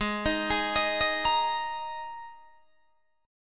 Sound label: G sharp Suspended 2nd, G sharp, down, Guitar, Open D